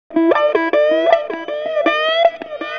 Slide Guitar

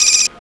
BEEP; East West - Phat & Phunky

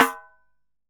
Pandeiro Finger Rimshot; Best Service; Festa Latina VOL-1; Single-Hits

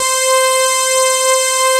CS SYNTHS; Pro-Rec - Synthsonic; JV ANALOG1

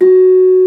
GENDER, Bronze Gamelan; E-MU Formula 4000 Series Vol. 4; Earth Tones

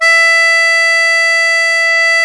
MUSETTE E3; Historical Instruments; AKAI; USB Soundscan vol.23

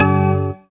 SYN, dance; Sound & Vision - Gigapack II

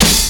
Snare, Drum&Bass; 90 s Sample CDs; Total Drum&Bass

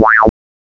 SWEEP; CMI Sound Library Rev 1.3

